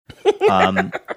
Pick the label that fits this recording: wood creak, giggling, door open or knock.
giggling